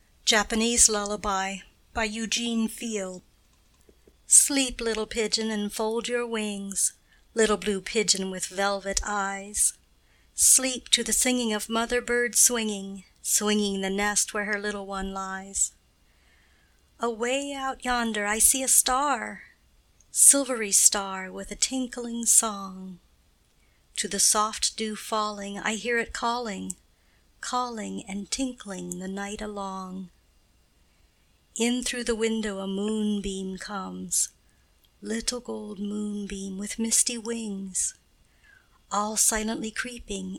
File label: little blue pigeon japanese lullaby